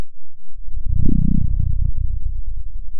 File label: pop, Sound of a dinosaur